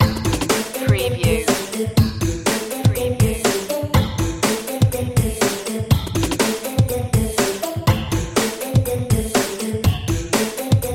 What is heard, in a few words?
computer soul